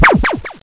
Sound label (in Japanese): puyoyonn